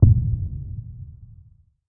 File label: heart beat